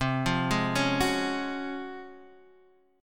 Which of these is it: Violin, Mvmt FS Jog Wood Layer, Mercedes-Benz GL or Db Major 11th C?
Db Major 11th C